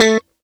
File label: a4 staccato, glowly bass